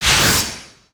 shakin

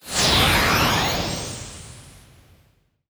dokan

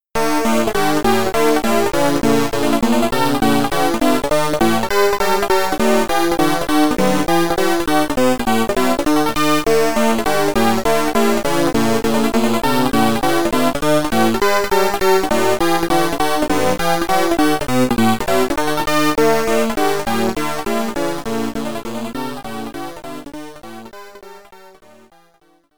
名前入れミュージック2～6位; Pole Position; arcade

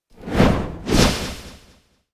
kikai oto

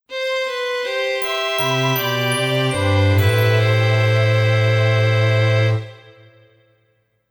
ジングル -「感動」; tokimeki memorial drama series vol.2 irodori no love song

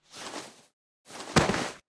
dodon

